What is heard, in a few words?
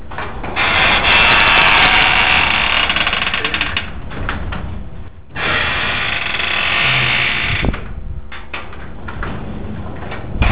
squeaky garage door hinge